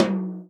tom, Real Drum Kit, jungle kit

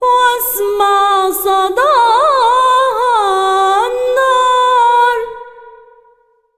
SINGER A, Arabian Traditions; USB Soundscan vol.43; AKAI

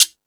Latin Layers, drum machine; E-MU Pro-Cussion-1

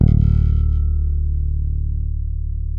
bass, sustain; Sampleheads; Will Lee Bass Library

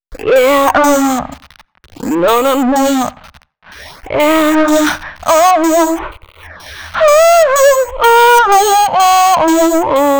female c#, Dance Ultra Voice FX; Mutekki Media

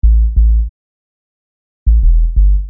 Low Sub

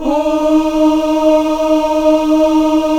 AFROOO D#4, AF.VOWELS; Spectrasonics; Heart of Africa 1

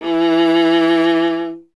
STR VLA, Viola; Solo Instruments; Viola 2; Miroslav Vitous

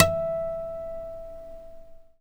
GUI Ac Har E, GUIT AC; Best Service Gigapack 2

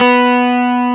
GUI STRATO, E-Guitar; E-GUITARS

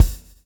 swing

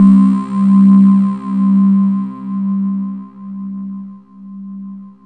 PPG wave C3; Zero-G Ambient 1; PPG WAVE #2

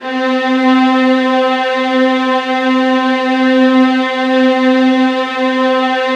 VIOLINS D, Strings; ILIO; Synclavier Strings

